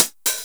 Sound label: Roland909